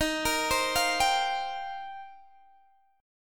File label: Eb 6th Add 9th Eb, Guitar Open G; arpeggiated